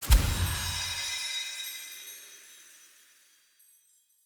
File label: UI Faction Choice Confirm